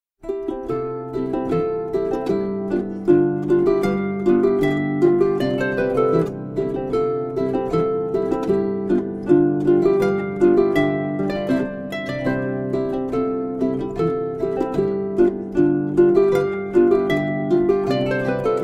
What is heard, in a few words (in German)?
Boarischer In C; weihnachtliche saitenmusik